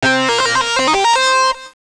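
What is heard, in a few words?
lead guitar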